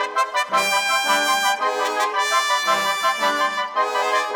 Brass Winds, Orchestral; Sonic Foundry (Sony Creative Software); Orchestral VOL-2

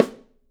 Drums, MARCHING; 3D Drums; OP MARCHING

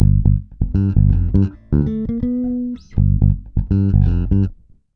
G, Bass, 81 BPM; Sampleheads; Ultra Freakin' Bass

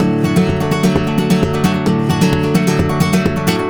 130 D Rumba; Spanish And Gypsy Traditions; Soundscan vol.59; AKAI

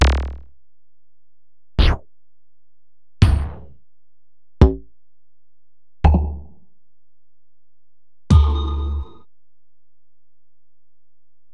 Alesis DM-PRO

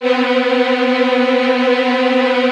VN TRILLS, Virtuoso Strings

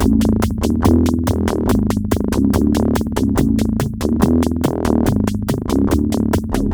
Bouncy Bass w beat, Synth Bass Loops; Basses; Sonic Foundry (Sony Creative Software); Groove Elements

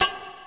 Tidal Perc, Genesis; Sonic CD; M8

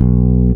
bass (B) long note; Sonic Foundry (Sony Creative Software); Ugly Remnants VOL-2; Basstuff

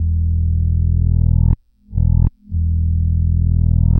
Backwards, Bass, Effected; Bass X

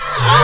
backscratch, scratches; Zero-G; Freak Beats

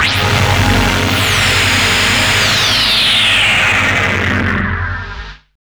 META FX, Hard & Loud Techno; USB Soundscan vol.01; AKAI

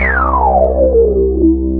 BAS, Eurotech; Sounds Good

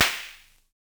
Snare, Univox SR-95

